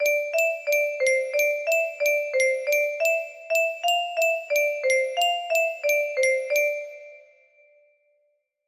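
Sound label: music box melody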